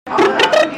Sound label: cat